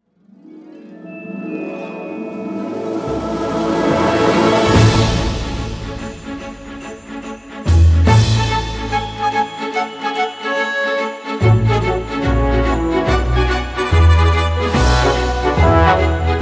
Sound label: Jingle Bells